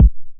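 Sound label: 909 BD tape, kick drum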